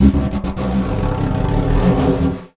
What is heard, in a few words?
Snare Drum; Percussion Samples